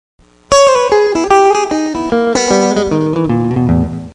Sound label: open swing